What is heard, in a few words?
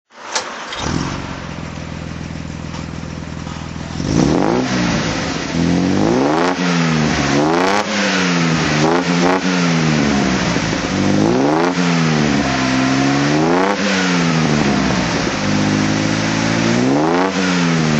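austin healey 3000 mk3 throttle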